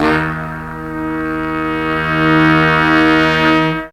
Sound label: BRASS BALLS, Hits & Brass